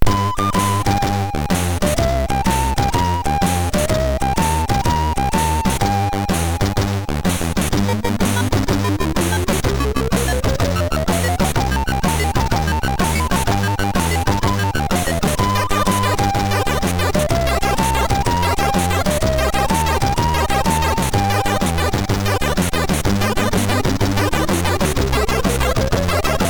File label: Royalty Beat, sid MOS6581R3